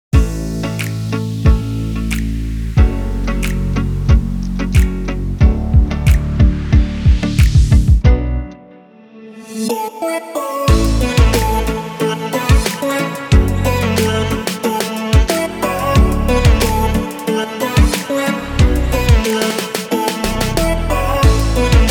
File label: instrumental